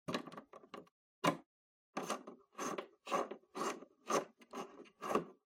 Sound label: TV Hardware Antenna Cable Plug In